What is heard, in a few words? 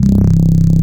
BASS, 170 BPM; Ueberschall; Drum & Bass Flash Trackz